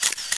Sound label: camera shutter